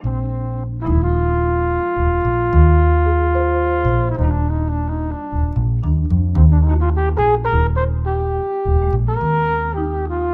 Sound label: With Horn